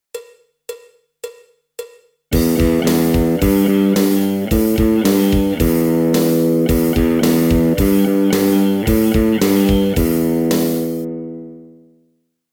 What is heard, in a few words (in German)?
Metal-Rock Lick, E-Gitarren